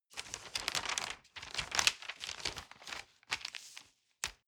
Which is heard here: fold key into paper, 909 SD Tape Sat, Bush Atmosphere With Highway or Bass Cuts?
fold key into paper